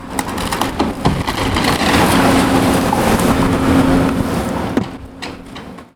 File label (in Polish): Dźwięk wyburzania